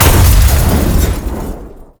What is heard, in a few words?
Enemies Exploding